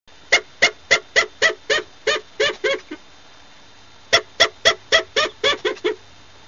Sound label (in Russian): ispug u shinshilly